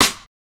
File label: Drum Side Stick